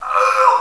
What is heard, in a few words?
GROAN